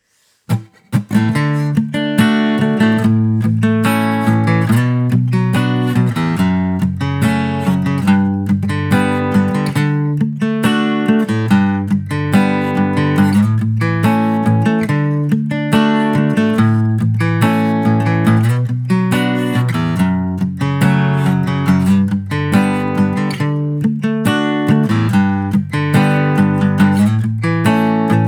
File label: canon backing track